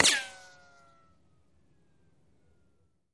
Bullet Ricochet Sin

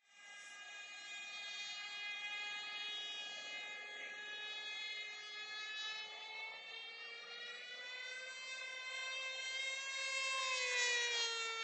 Rc Plane Radio Contro, rc airplane; Radio Controlled Model Airplane Flies Around. Exterior

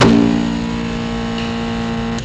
Magnet Hum Old, buzz & hums

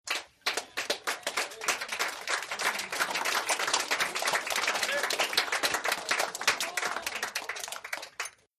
Applause 100 People

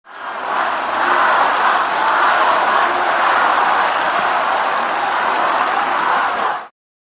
Large Crowd Cheers, concert

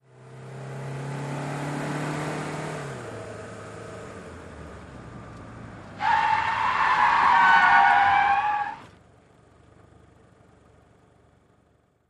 Sedan 180 Skid Mix

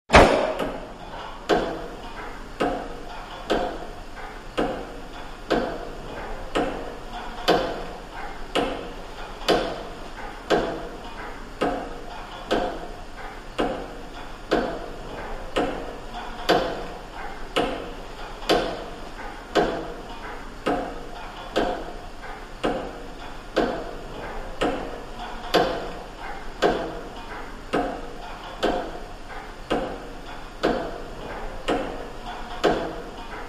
Lg Reverberant Room, ticks

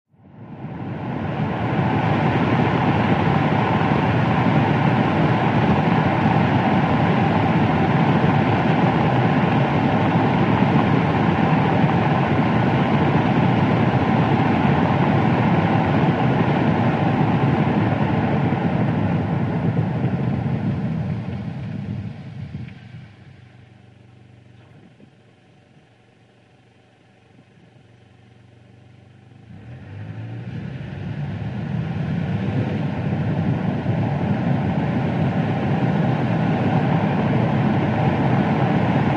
Sedan At 5565 Mph S

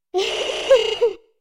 Female Robot Laughs, servos

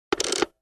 Mech Button Mech, switches

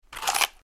Bite Bone Or Hard Vege, crunch

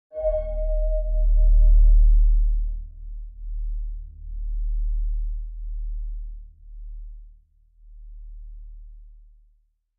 Bell Pulsating Chime

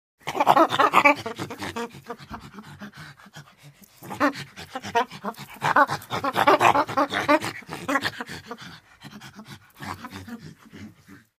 Chimpanzee Grunts

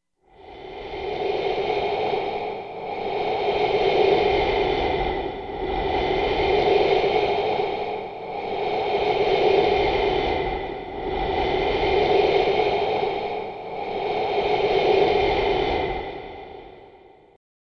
Human Breath Through Reverb For Ghostly Presence; ghosts & demons

